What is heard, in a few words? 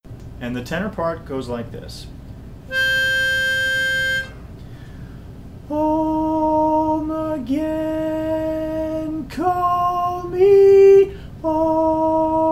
Tenor, barbershop